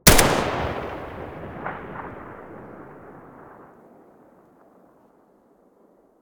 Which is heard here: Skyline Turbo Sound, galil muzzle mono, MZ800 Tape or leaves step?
galil muzzle mono